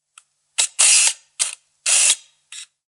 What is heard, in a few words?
Camera Focusing And Zooming